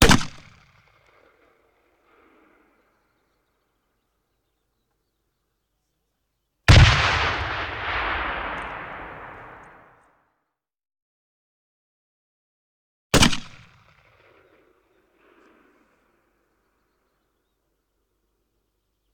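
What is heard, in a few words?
Artillery Grenade Launcher; Sound Ideas; Guns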